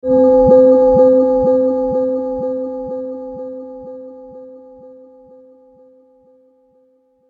Dark Future Bell; Scary Soundscape Sound Effects; science fiction sound effect

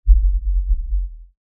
LFE Ultra LFE Hit, Lfe Ultra Low Bass Hit Impact; Deep Tone

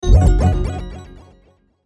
Sweeper Warble Descend; Sweeper Warble Ascend Descend; Production Element Imaging Element Accent Transition; Warble Sound Effects

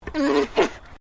ANIMAL ALPACA MALE GRUMBLE; Alpacas on a farm in Gippsland Victoria; Miscellaneous Animal

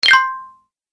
Award - Game Collect; Video Game Sound Effects

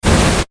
Science Fiction Flame Booster Short Burst

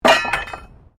METAL SPIKE FALL; Materials

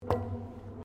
Footsteps Boots Metal Spiral Stairs Steps; Footstep Foley; Human

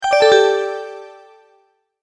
Multimedia Tone-Return/Back

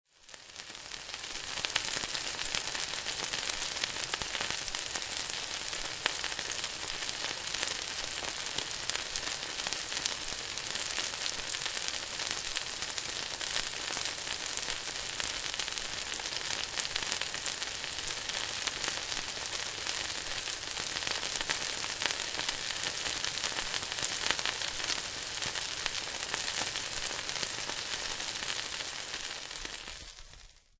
Frying; Kitchen, frying food in skillet or frying pan

